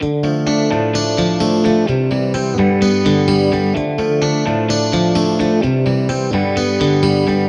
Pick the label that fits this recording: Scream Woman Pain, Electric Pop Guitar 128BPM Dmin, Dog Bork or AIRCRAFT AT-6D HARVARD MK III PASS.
Electric Pop Guitar 128BPM Dmin